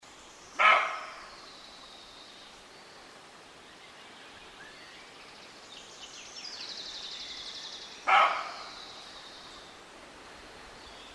zvuk samca kosuli

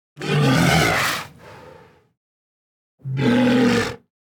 morskoj lev

